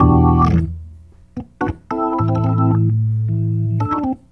Organ

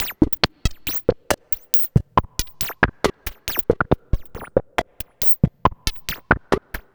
Electronic Percussion

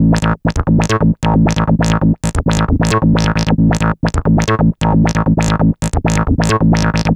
Synth Bass; Basses

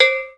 C3 AGOGO; proteus2

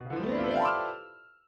Acoustic Piano

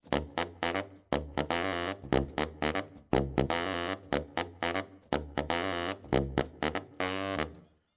Sax

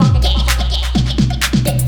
Old School Break, Drums

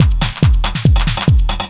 Dance Drumloop; Drums; Dance Beats

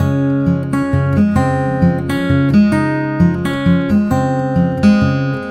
Acoustic Guitar; Guitars

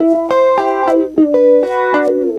Electric Guitar, Loops; Guitars

